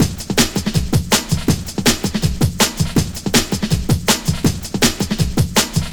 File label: 162, breaks, Loops; M8